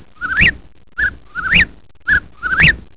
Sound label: bird